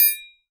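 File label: triangle, Synclavier Percussion; ILIO; Synclavier Percussion 2; World & Orchestral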